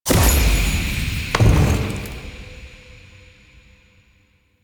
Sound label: Glyph Puzzle Push Action Success; Warframe; Duviri